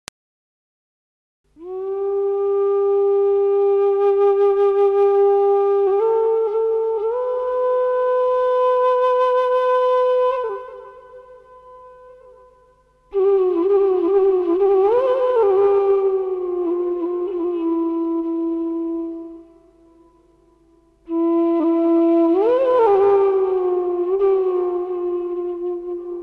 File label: Flute